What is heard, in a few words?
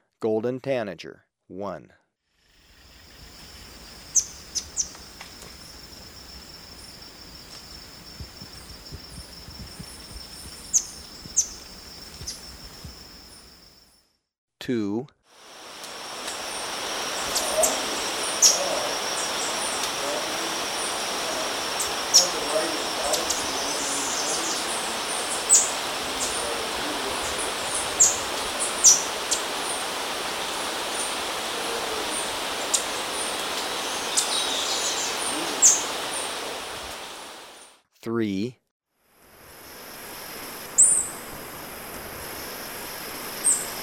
Golden Tanager; S. America